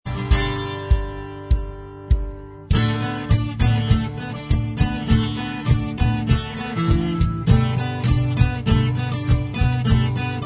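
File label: acoustic style, instrumental, backing track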